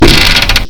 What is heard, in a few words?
floor creak